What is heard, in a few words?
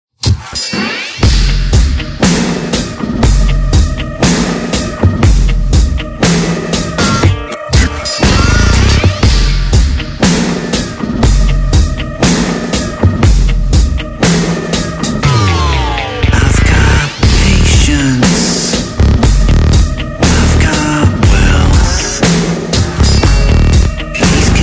Distant Drum